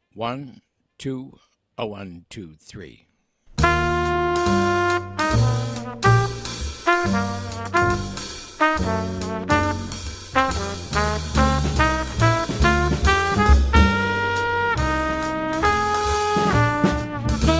minus piano

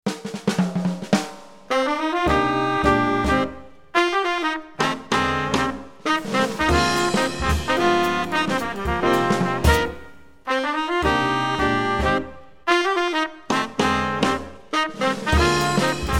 minus Bass

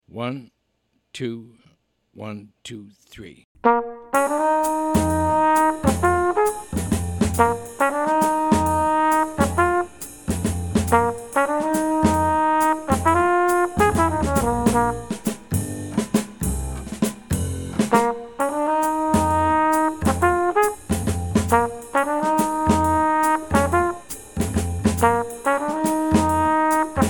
minus piano

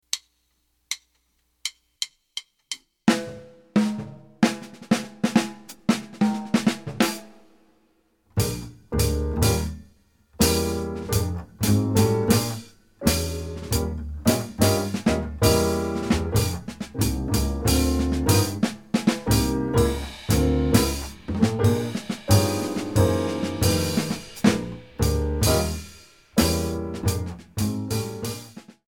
medium minus Melody

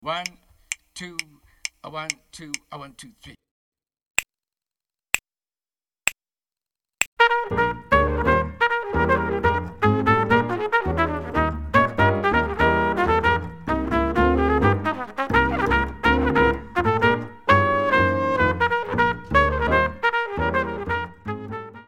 uptempo, minus Drums

